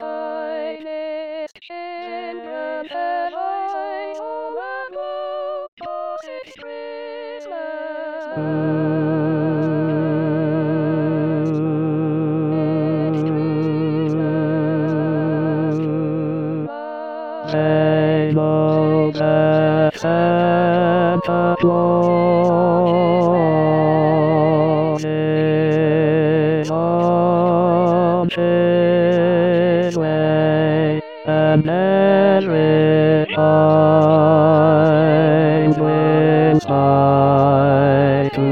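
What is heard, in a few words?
Bass; Gritton; Xmas 2019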